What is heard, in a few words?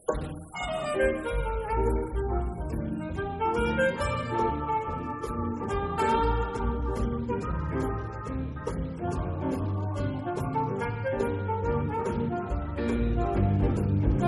Cool Swinger Jazz